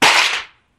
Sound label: bottle